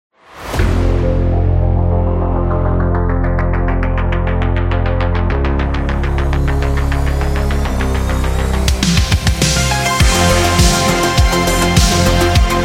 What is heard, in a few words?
Drive 80s